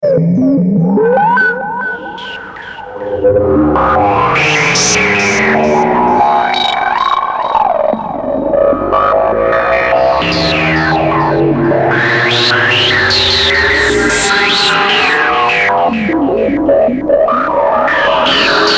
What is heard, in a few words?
Sample Hold, tinysizer